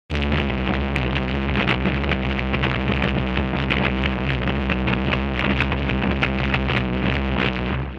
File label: ufo